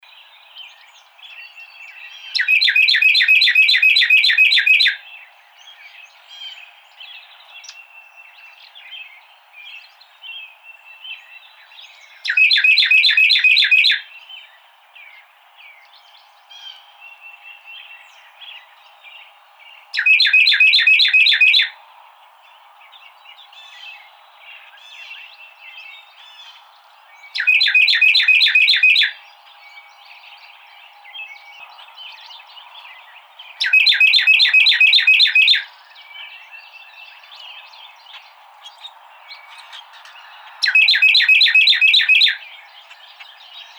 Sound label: Carolina Wren